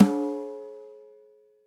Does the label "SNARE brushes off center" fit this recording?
Yes